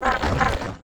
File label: WAR WALK; GRAVELAPIS; Mob